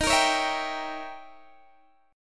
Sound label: Eb 7th Flat 9th, Eb, Guitar, Open G